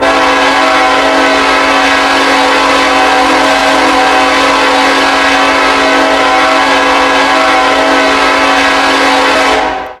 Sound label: k5lla, horn; run 8 v3 horn pack